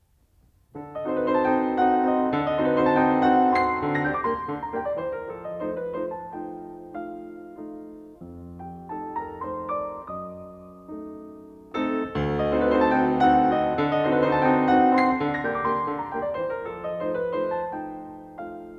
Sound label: Carnaval for piano; Schumann; Op9; Rubinstein